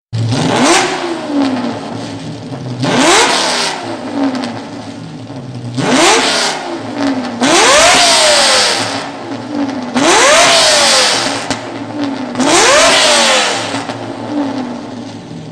tieng xe Lamborghini; nhac chuong

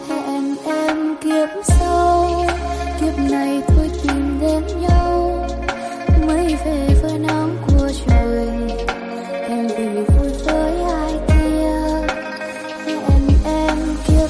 Nhac Chuong Lofi

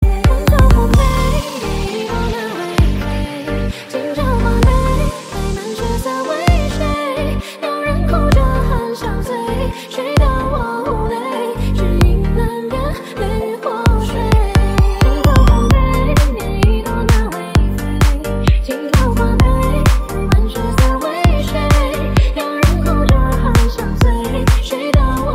nhac chuong remix

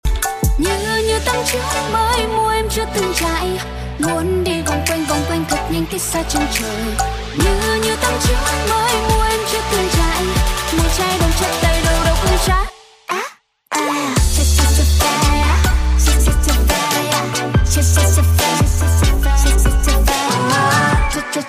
Nhac Chuong Trai Trai Trai Phai

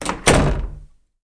am thanh tieng dong cua ram

